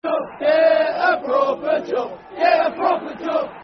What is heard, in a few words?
Get A Proper Job Football Chant (Soccer Song); Milton Keynes Dons Mkdfc